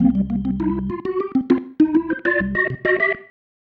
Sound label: Single Riff, Organ